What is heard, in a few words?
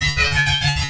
blas loop; Shadowman; baddys; tflegion